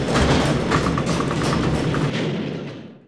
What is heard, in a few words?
conveyor; xatrix; world